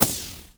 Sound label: poof